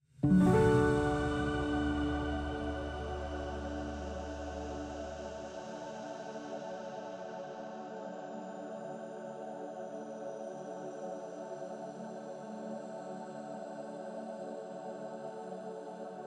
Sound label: Forest Recording Buttons Stinger D